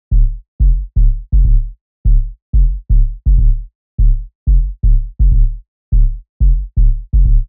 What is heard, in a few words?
124 Bass Loop Groove